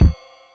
Realistic Concertmate-670 Rhythm Kick Ride; Rhythm Layered Kit

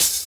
Open Hhat, HiHat; Equipped Music; Loungin House

